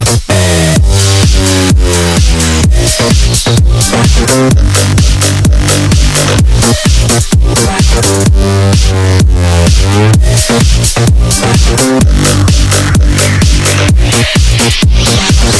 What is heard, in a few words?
zm dnb